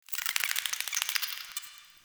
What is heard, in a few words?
Interior Clicky Critter; Ambience; Indoor; Warframe; Infested Eris